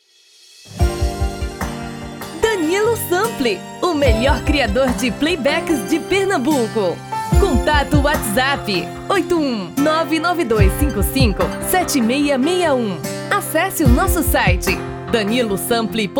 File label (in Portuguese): tom feminino